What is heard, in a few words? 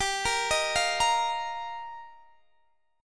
G Minor 7th Sharp 5th, Guitar, Standard; arpeggiated; x,22,20,20,18,18; JGuitar